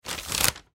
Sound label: Paper Bag Fold, Paper Bag Fold Crease Close Brown; Paper (Sound Effects:Materials); Foley; Blastwave